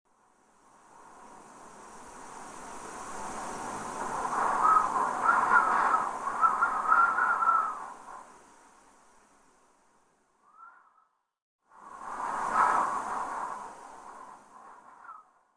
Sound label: Howling wind